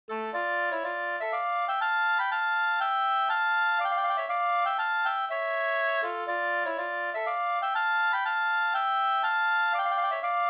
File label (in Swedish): polska godnattlat